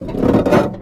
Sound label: Structure Crunch; The Avali's Audio Overhaul